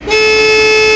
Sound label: Incoming Alarm